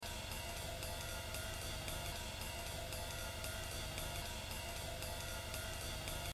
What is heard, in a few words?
Cymba; Exercise 20 Buddy Rich; The Evolution of Jazz Drumming (Gottlieb)